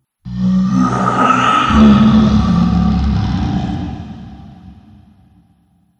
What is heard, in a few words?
Zombie - So Gross; horror; Zomby Sound Effects